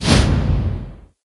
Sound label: attack